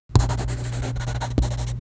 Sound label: pencil writing on paper